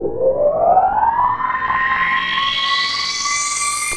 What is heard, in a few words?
PROPHE FX, SYNTH FX